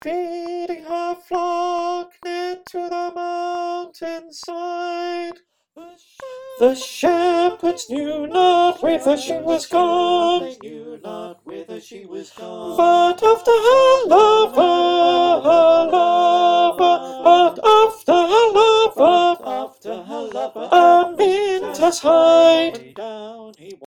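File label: Alto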